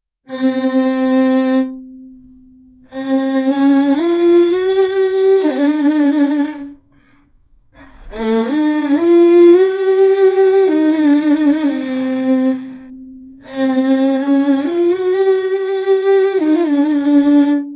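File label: sorrowful aria a female voice, strings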